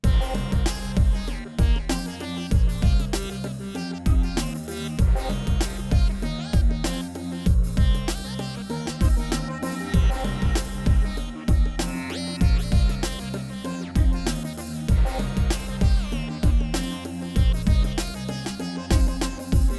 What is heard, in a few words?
Stock Tubes